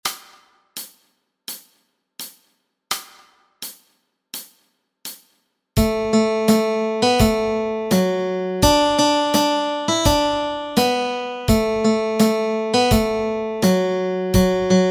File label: melody